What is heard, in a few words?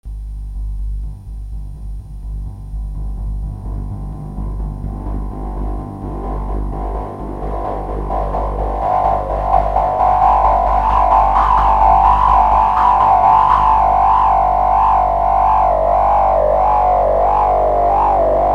x y mod, oberheim xpander